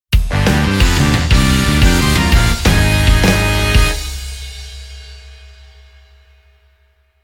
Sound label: Jingle Quest - Intro; sonic frontiers original soundtrack stillness and motion